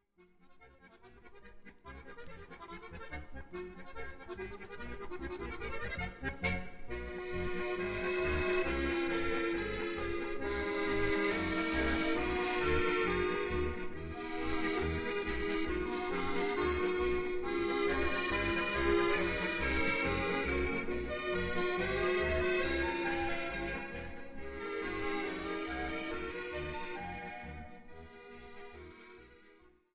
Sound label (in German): linzer polka